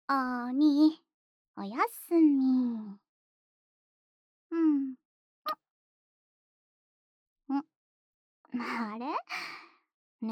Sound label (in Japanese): おやすみのチュー; ASMR